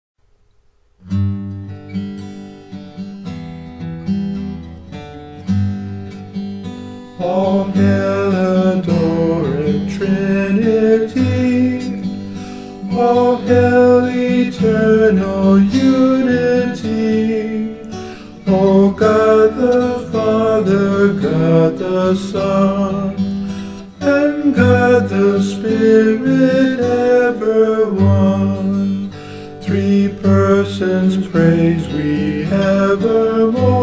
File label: choir